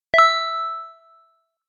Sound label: text receive